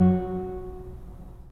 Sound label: 3DPipeMixCeleste, 3DOrgans; E-MU Producer Series Vol. 5; 3-D Audio Collection